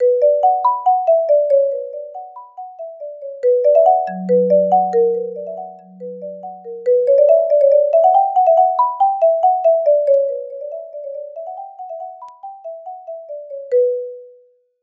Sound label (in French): percussion